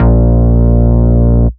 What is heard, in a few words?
Root A#, Ultimate Bass; Vengeance Samples